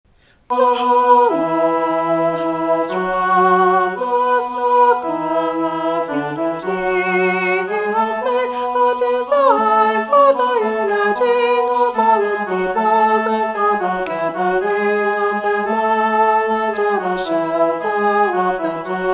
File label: alto